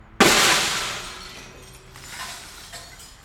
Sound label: Breaking Window